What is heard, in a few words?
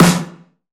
short reverb snare sound f key, Royality free snare drum tuned to the F note.; snare drum samples